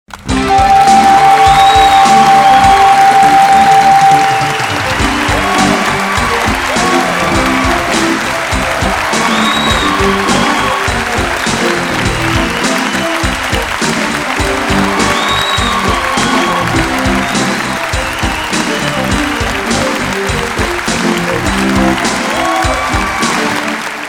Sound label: sfx audience big win